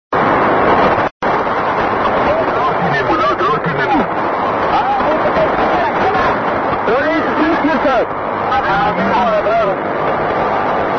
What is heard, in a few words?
meteo 9231 kHz; timimoun; adrar